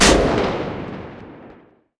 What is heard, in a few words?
mg 8mm l8a1 lastshot; guns; tanks; War Thunder